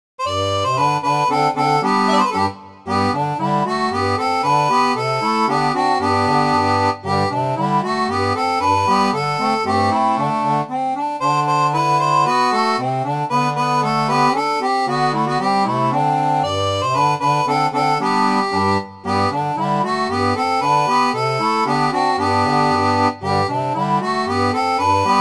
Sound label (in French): seraphone, orgue barbarie